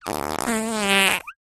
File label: cartoon fart